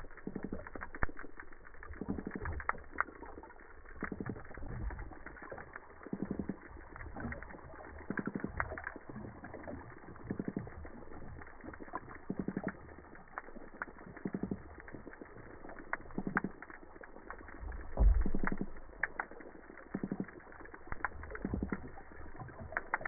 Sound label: Sciaena umbra Regular